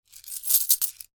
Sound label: money coins in hand movement; foley; Objects; McKinney Sound